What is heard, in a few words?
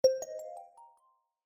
delete, ui; Realme 8